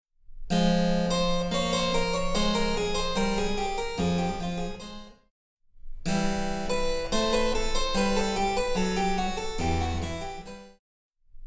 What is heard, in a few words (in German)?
Cembalo; Mark Lindley WTC 1